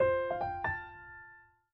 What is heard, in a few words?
Piano, notifications; Honor 600-Pro-China